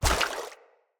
Step Water, Footsteps; Enemy; Corrupted Sproutfolk

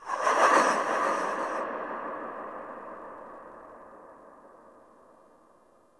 Movement Accent, ambience; HarrowQuest; Operator Twin; Warframe

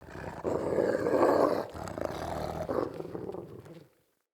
Wolf Growl Enemy Spotted; Animals; Perception

